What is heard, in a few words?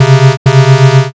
wrong